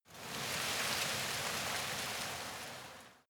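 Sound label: tree leaves rustle soft short, garten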